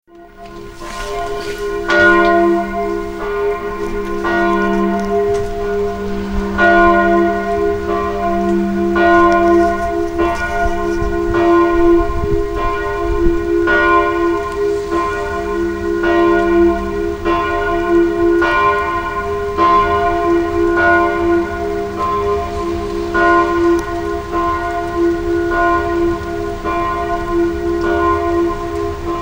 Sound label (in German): Glocke